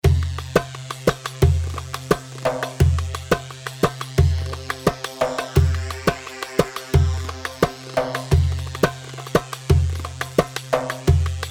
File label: Wehda Saiidi 87, Darbakati, Sharqi; وحدة صعيدي